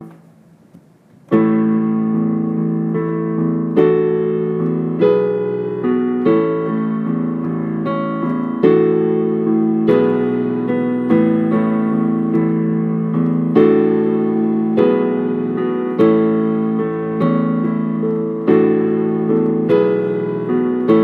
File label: just piano